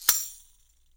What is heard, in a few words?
TAMSHA, EFFECT CYMB, 3D Drums; 3D Sound